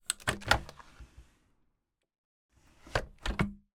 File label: household window velux open close